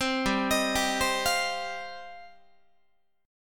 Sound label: G 7th Suspended 4th Sharp 5th, Guitar; G7sus4#5 arpeggiated